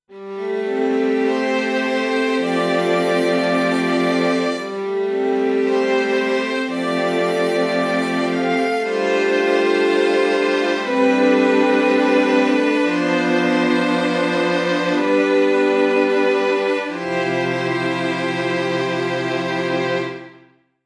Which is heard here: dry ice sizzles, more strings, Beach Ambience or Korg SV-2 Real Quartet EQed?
Korg SV-2 Real Quartet EQed